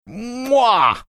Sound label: mwah